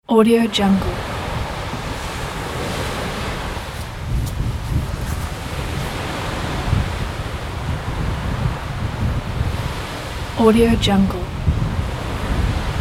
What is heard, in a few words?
winter seashore and strong wind